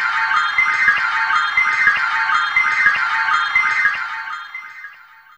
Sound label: Sl Bells A3; Fairlight CMI IIe